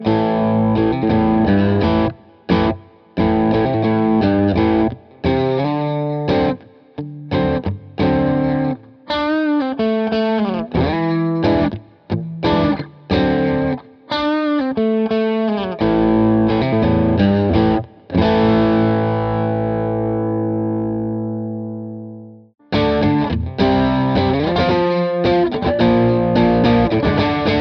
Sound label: Bridge Split Dirt, pickups